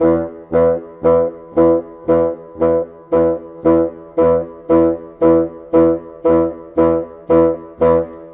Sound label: Bassoon, Cinematic; Sonic Foundry (Sony Creative Software); Orchestral Series VOL-3 - Cinematic